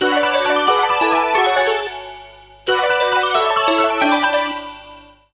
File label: Oriental